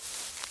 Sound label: grass; firefight